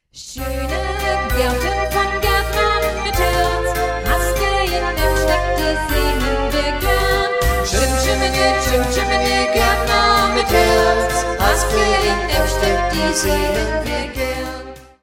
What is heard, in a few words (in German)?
jingle